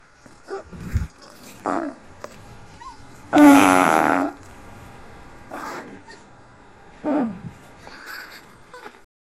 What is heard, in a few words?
fart